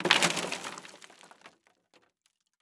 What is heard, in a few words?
Crash Wood Debris Dropping On Wood; crashes; AdobeCC